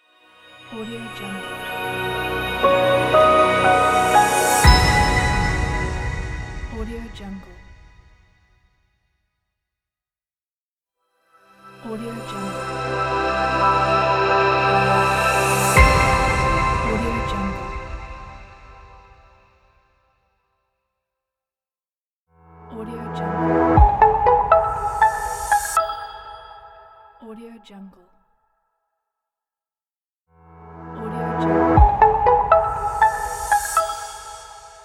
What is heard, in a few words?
digital sting logo pack